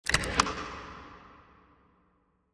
Reel Start; fire Wizard Desktop; Axial

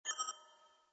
reel stop; Rabcat; Classic 243

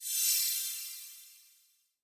enter game; Skillzgaming; gems odyssey 92 desktop

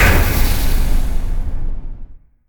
Win; Jason's Quest

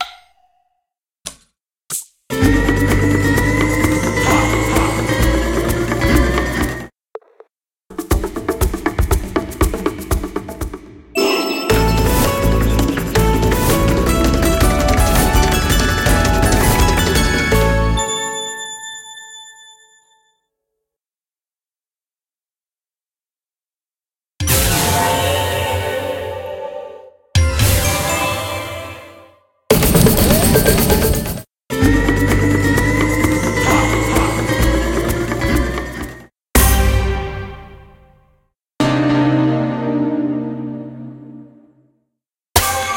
Game Sounds Base; Tiki Mania

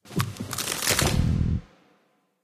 gamble loss; Dragons Myth

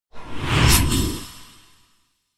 curtains close; medallion; Fantasma

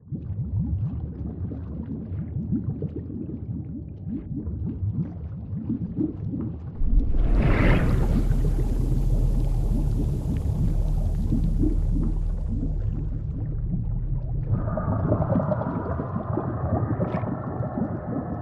comic intro sfx; Recode; rampage riches Desktop

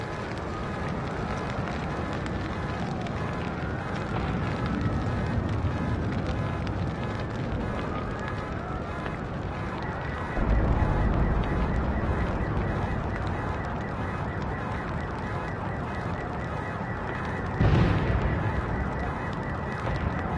idle ambient sfx freespin; Recode; rampage riches

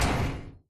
Reel Stop; Recode; rampage riches Desktop

